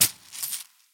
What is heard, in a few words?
Catching coin purse